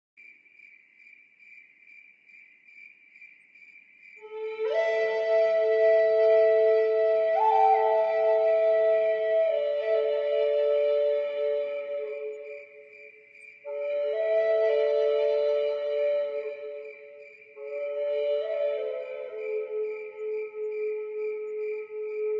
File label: Native Nocturnes, Relaxing Native American Flute Music